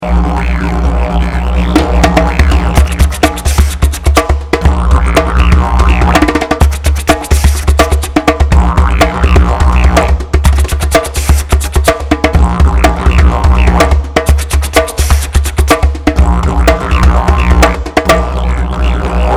Energetic Tribal Music

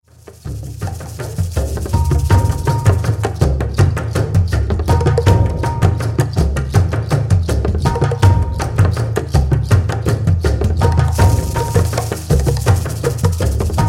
Sunset Drum Ceremony

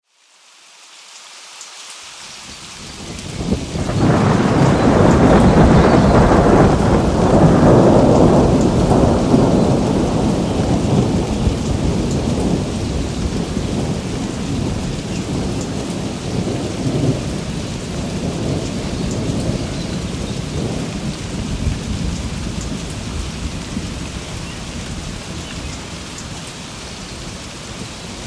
Inspiring Thunderstorm

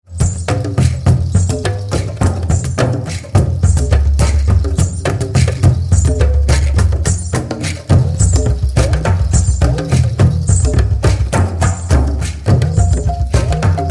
Guardian Drum Spirit

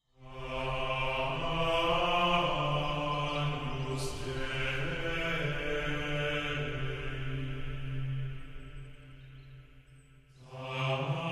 Gregorian Devotion

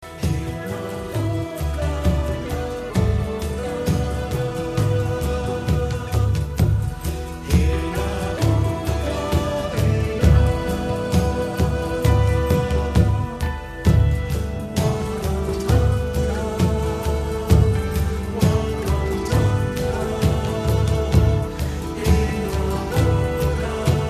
Sacred Drum

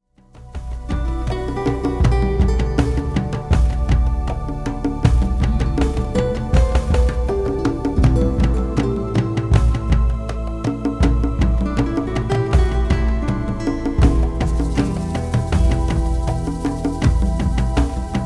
Sacred Drum

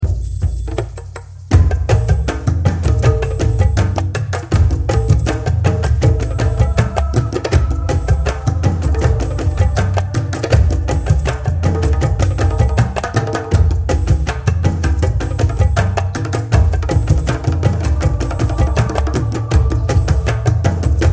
Heart Drum Path

